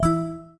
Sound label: paytable Button; twin spin